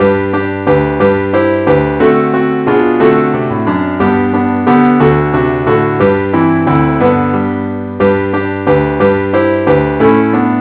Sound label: keyboard only, key G